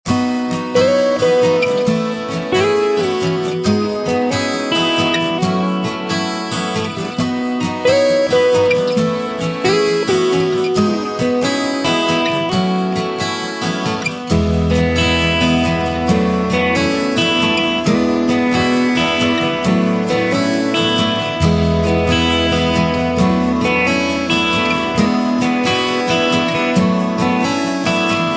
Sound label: acoustic band